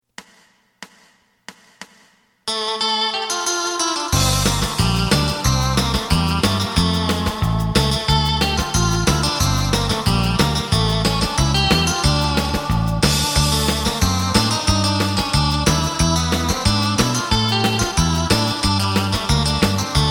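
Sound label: side guitar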